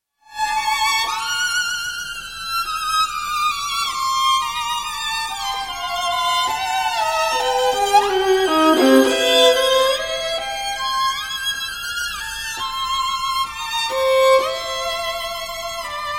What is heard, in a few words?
melodies for violin and piano; sergei sergeyevich prokofiev; five melodies